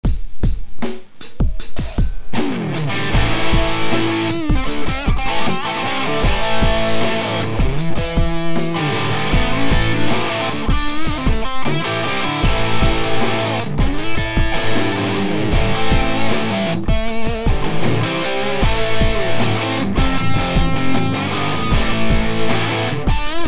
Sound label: Superbass